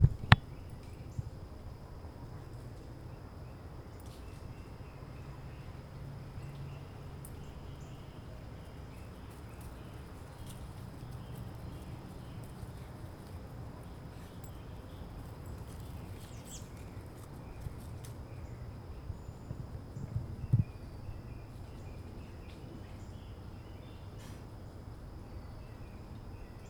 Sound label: ambient noises suburbs early morning